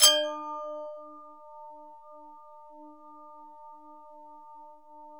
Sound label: GLOCKEN; Bolder Sounds - Granular